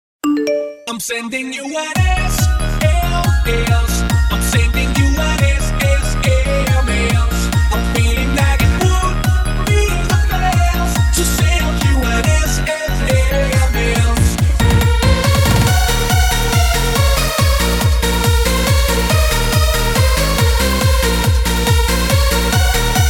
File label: sms